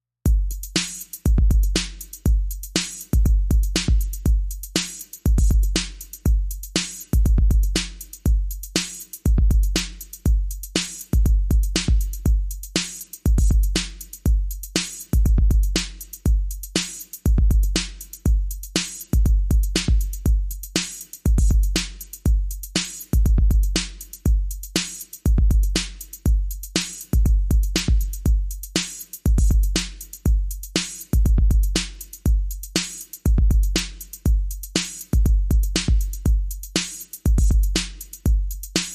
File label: Bonus Beats